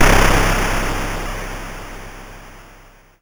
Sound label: YM Noise; NOISES; ChipShop Deluxe; ChipShop Hits